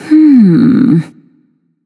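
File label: nonverbal thinking; smite; voicepack; izanami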